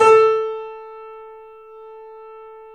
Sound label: A, The Piano; InVision Interactive - The Piano